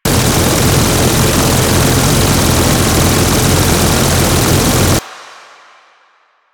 Gatling Turret Loop; Warframe; Jade Shadows; Quests

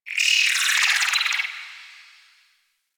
Landscape Glowing Tree Insect; ambience; props; one offs; Warframe; Venus Open World

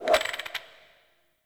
Ur Bad Roll; UI; Minigames

